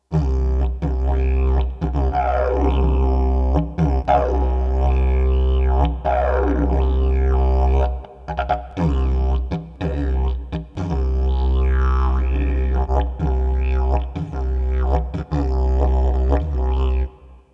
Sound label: wind didgeridoo grooves